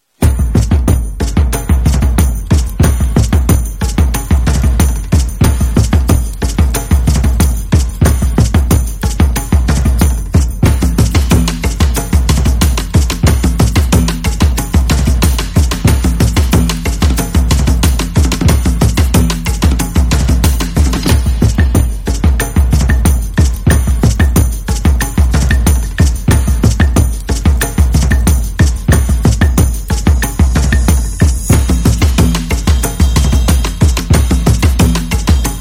Traditional Jungle Beat, amazon drums

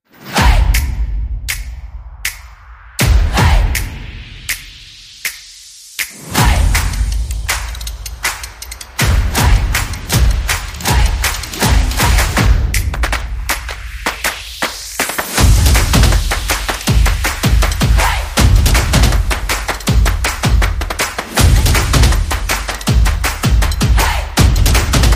Upbeat Percussion

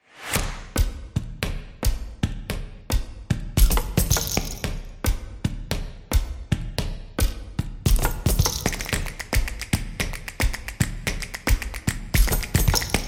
Motion, soccer drums

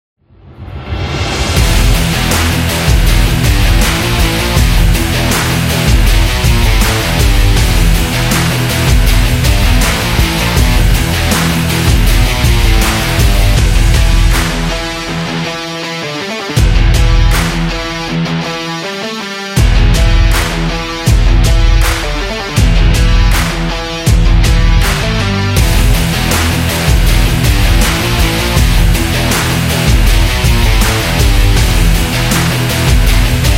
Rock

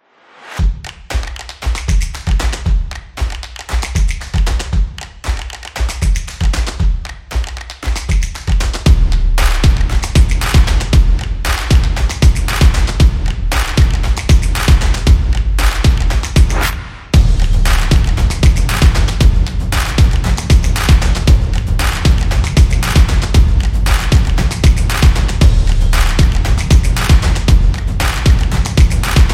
The Drumming; high energy

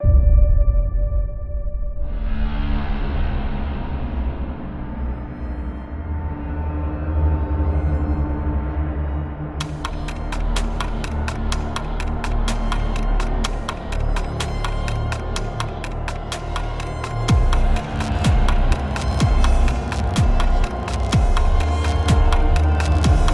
Tense; dramatic

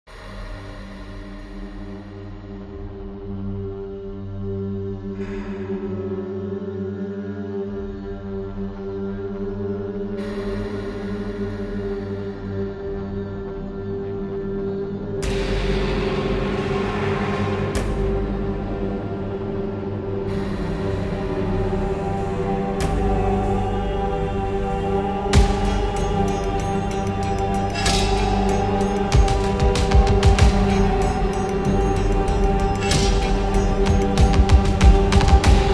A Thriller

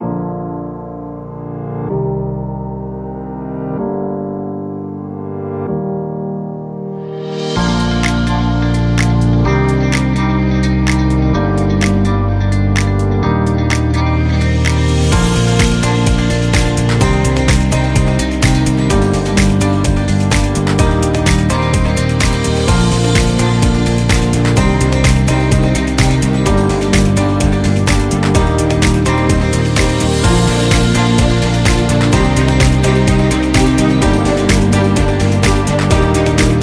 Upbeat Corporate Music

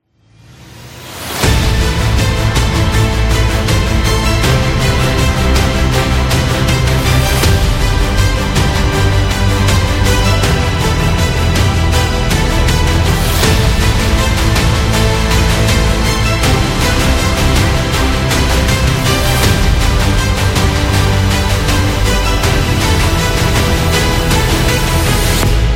Epic Cinematic

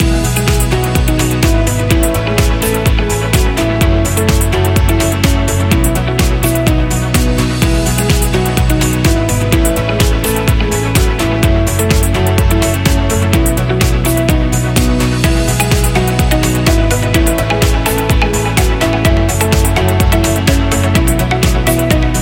Positive Loop

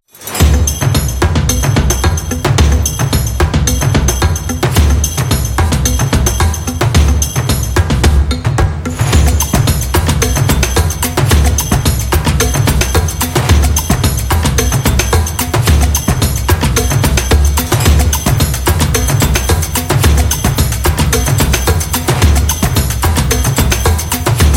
Safari Wild Beat, Amazon drums

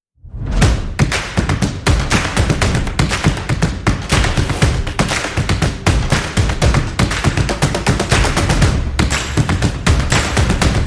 sport drums and percussion; action drums percussion

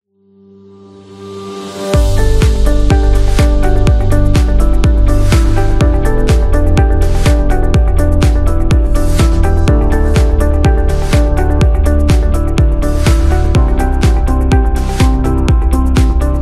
This Is Corporate

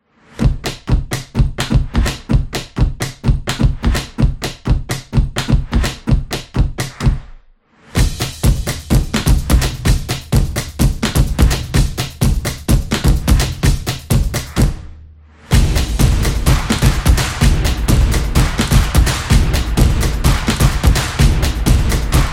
Typo Intro; fast tempo

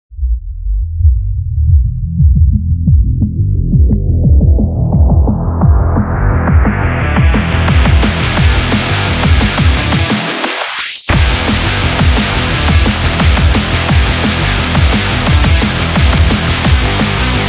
Speed Rock; sports training energy

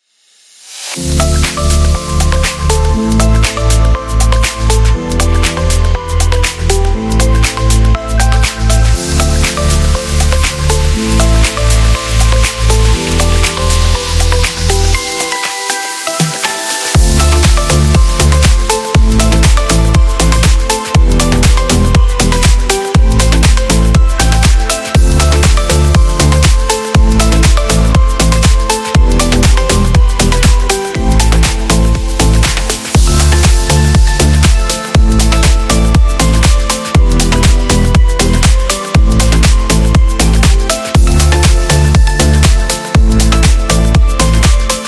Tropical

